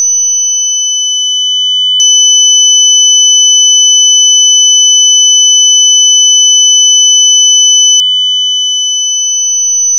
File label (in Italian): suono acufene fading